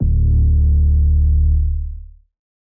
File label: 808s